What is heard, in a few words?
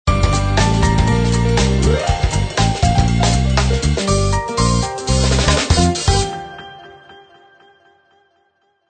A Bright Flash Logo; 企业标志包 Corporate Logo Pack